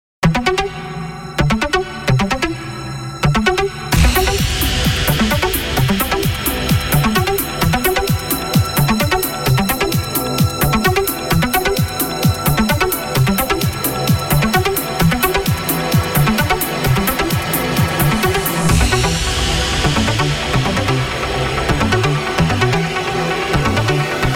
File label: Trance